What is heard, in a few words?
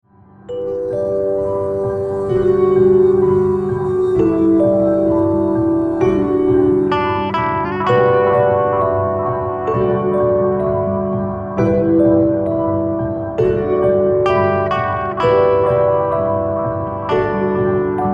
Late Night Lullaby